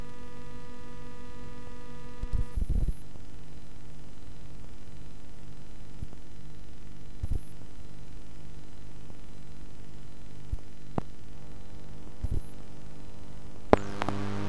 attraction, telephone pickup

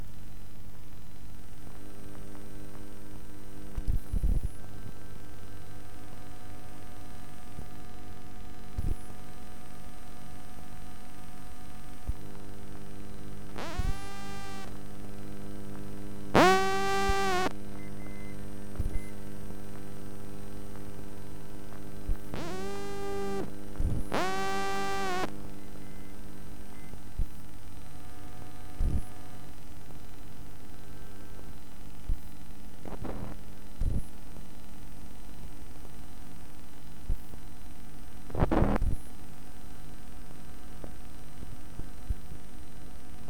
cranefly, telephone pickup

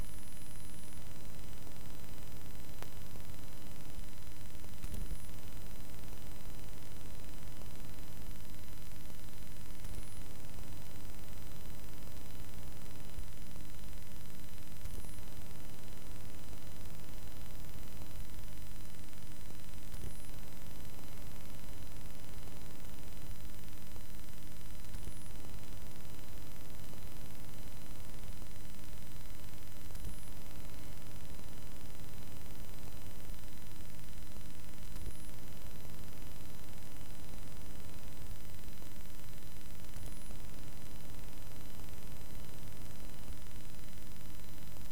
tandy102, telephone pickup

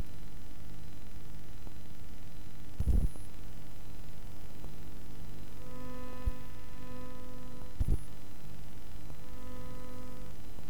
attraction lcd monitor, telephone pickup

